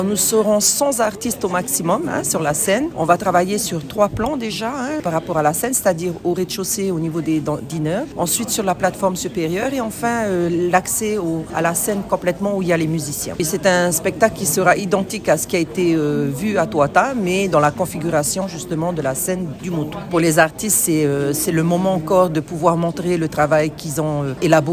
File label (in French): trompette; HEIVA; MINI HEIVA Tiare